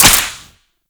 Whip Impact Default; Warframe; Grineer

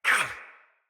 Pain Knight Male; Battle; Barks; Dialog; Mendicant

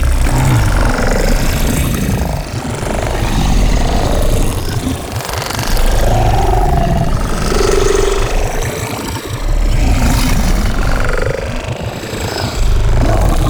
Ink Wall Loop; Gameplay; Warframe; Shadowgrapher

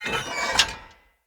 Light Mirror Move D; Puzzles

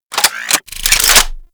Polearm Equipped, Weapons

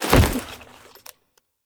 Foley Jump; Warframe; Characters; Kahl

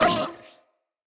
Kubrow Feral Vocal Pain

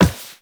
walk metal, footsteps

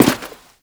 Step; Warframe; The New War; Characters; Veso

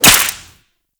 Whip Impact Default; Warframe; Grineer

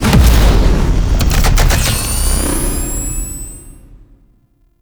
Spawn; Warframe; Veilbreaker; Venkra

